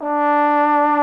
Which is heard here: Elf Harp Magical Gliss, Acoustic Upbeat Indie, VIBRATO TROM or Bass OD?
VIBRATO TROM